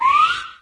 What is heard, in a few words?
Nut Out; candy crush saga gamerip